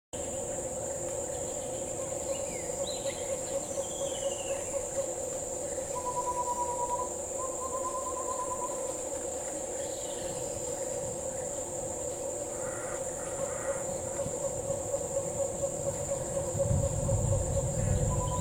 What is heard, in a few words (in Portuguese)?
canto do banded broadbill